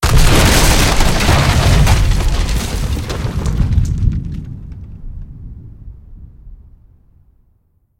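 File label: plantation explosion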